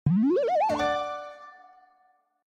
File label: Level Up Sound